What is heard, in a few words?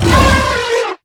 att, enemy, combat; Cosmic Rage; baurg